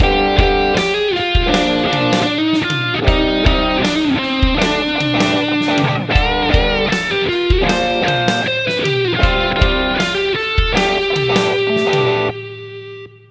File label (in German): Swamp gedoppeltes Gefiedel